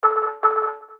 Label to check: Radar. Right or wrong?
Right